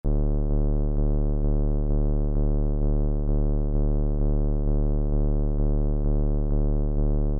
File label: minitaur techno